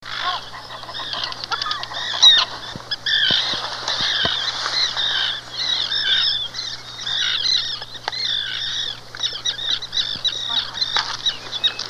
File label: Common Tern